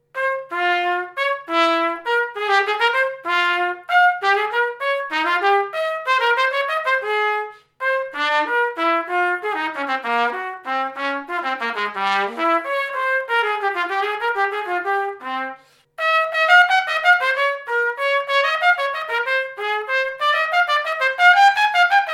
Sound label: Gigue Cello Second Suite; Bach – from Cello Suite No 2; trumpet